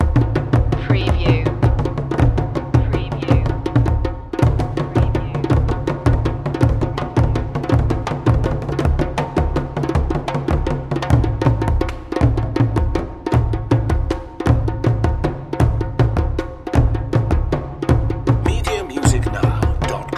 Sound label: african drums bugobogobo fusion loop